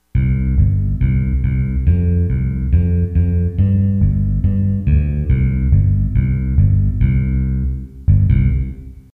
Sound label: bass country